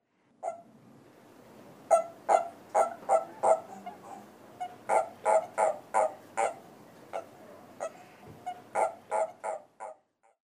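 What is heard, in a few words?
Japanese Toad; hikigaeru; kokubunji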